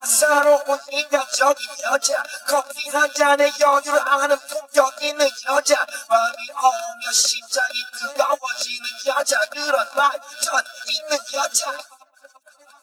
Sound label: vocals